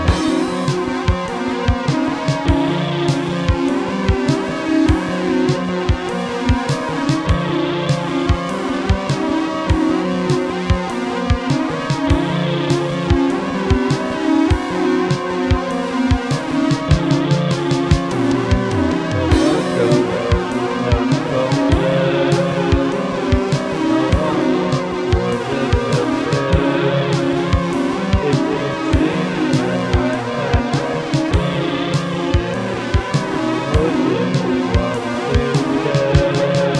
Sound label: loop